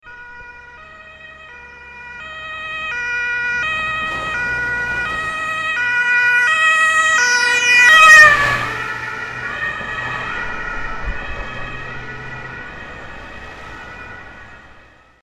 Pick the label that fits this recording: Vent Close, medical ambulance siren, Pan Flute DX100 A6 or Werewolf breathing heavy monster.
medical ambulance siren